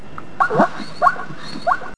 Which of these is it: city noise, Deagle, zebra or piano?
zebra